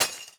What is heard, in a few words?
glass break; PonPonChun; Android